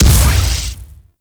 Ship Dual Cannon Impact; Crp Moa Dual Cannon

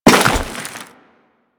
Crewman Step Ice, Footsteps; Warframe; Corpus

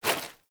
Foley Action Default Punch; Characters; Player

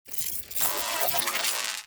Shock Mote Strike Charge Up

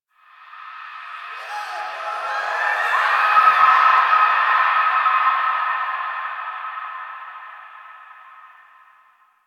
Memory Echoes; Warframe; Quests; TheNewWar; Ambience; Zariman

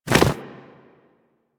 Kubrow Foley Idle Alert Shake Short; Enemies; Warframe

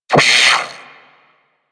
Sentient Brachiolyst Pain

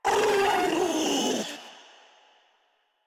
Infested Ancient Vocal Attack; Warframe; Ancient Ninety Nine; Proto Infested Ancient

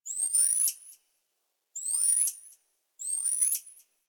Night Zone Tree Critters; Warframe; Ambience; Eidolon Plains; One Offs

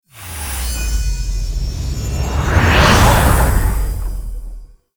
Timer Expired Stinger; Tau; Twelve Minute War; Objectives Meta

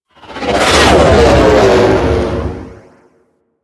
Ship Close Short Fly By, Engines; Warframe; DE; Vehicles; RailJack; RailJackEnemyShips; Grineer; EnginesGeneric

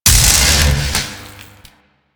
Alt Orb Zap; Weapons; Warframe; Tenno; Grimoire

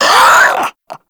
Infested Crawler Hear Sound; Warframe

